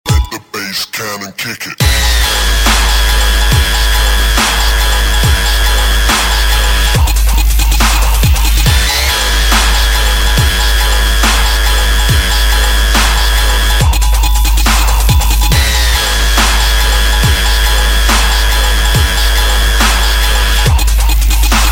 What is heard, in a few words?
bass